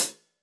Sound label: KHats Clsd